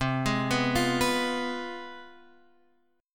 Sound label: C Major 7th Suspended 4th Sharp 5th, down, Guitar, Open F; CM7sus4#5 arpeggiated